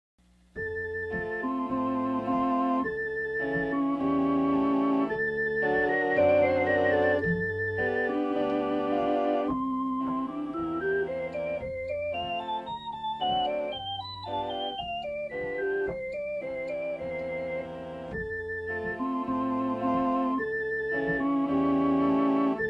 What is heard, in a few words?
Melodic Peaceful Offertory